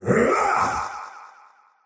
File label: attack big; dota; faceless void